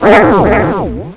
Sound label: pain; QUAKE2